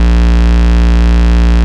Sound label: Vengeance Ultimate Bass A# Root A#; Vengeance Samples